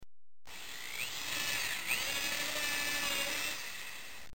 Perceuse

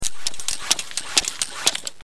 Pompe

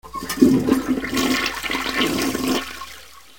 Toilette

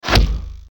Fleche

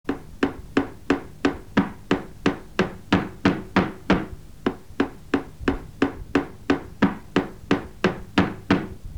Marteau

